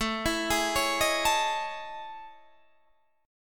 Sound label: A Major Sharp 11th, Guitar DADGAD